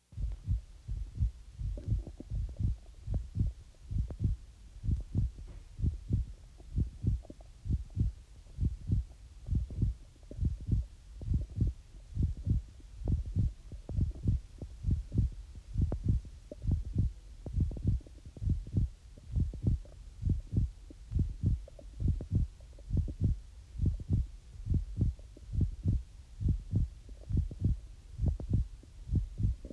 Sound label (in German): Urwald Herzklopfen langsam schnell